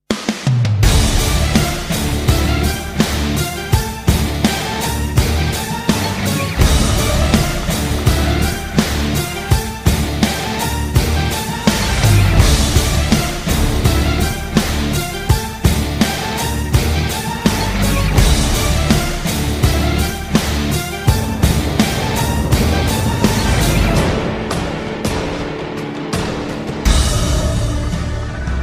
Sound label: Epic Music